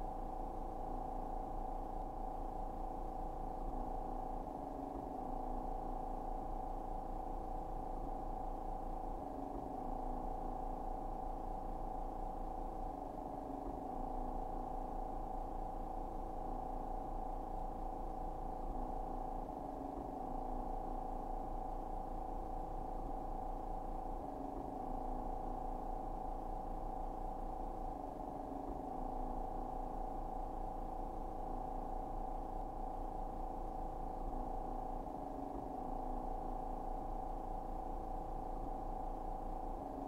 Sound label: charging; interact-sound